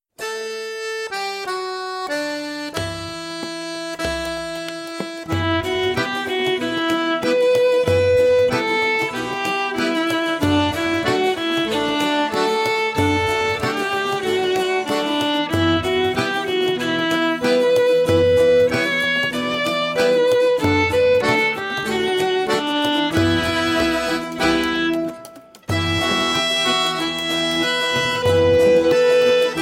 Practice Tempo

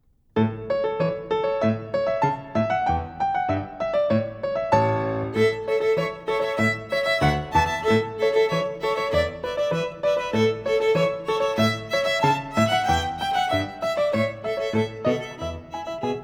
Fiddling fast tempo

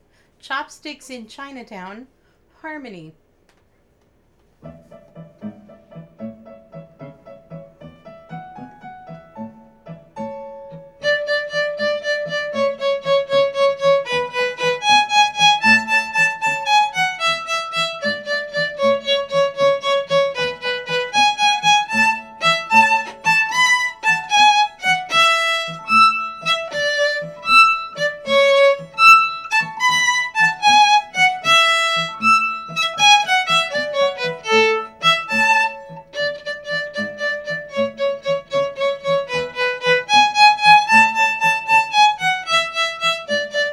Chopsticks in Chinatown harmony

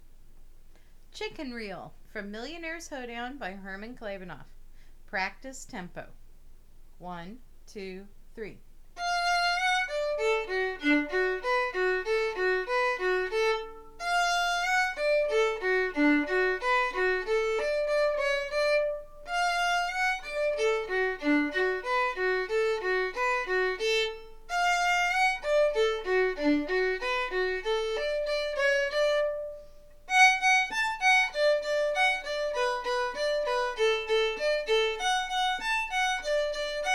practice tempo

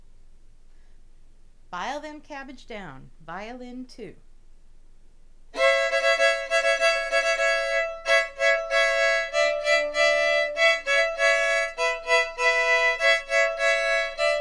violin

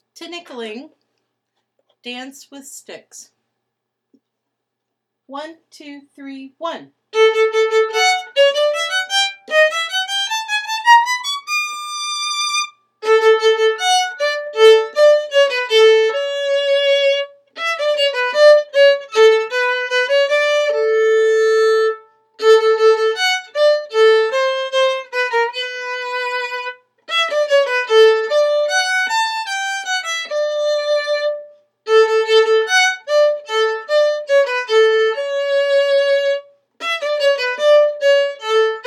Tinikling Dance with Sticks

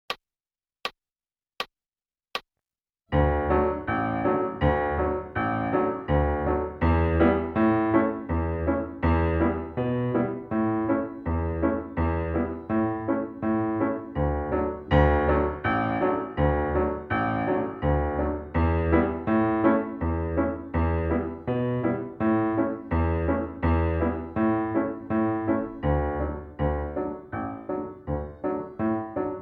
piano acc; Golden Slippers

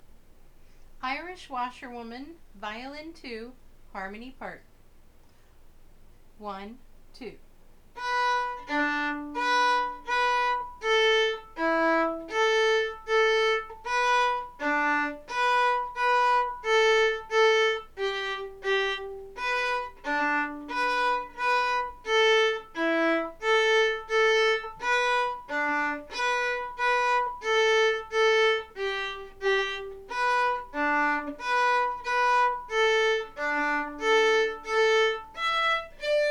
violin harmony; Irish Washerwoman

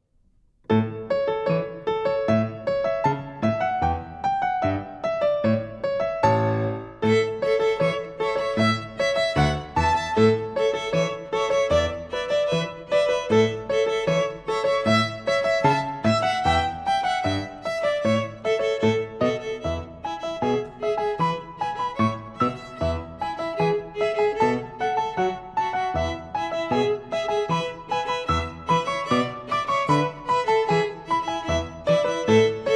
fiddling slow tempo

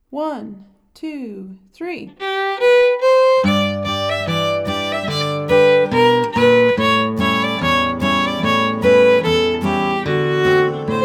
harmony; Snow Deer

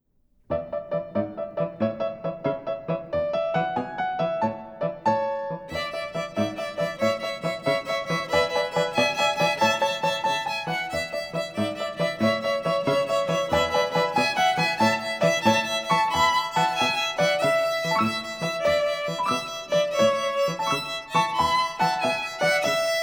Chopsticks in Chinatown fast tempo

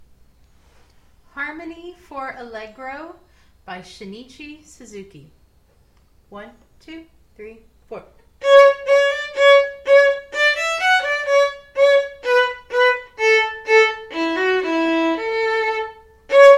Allegro Harmony

